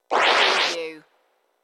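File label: DJ Record Scratch Effect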